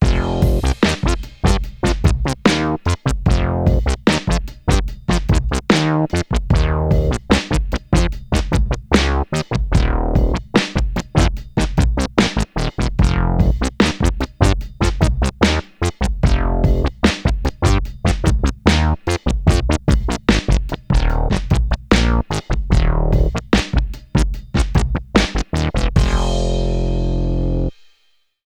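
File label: THICK ACID with DRUMS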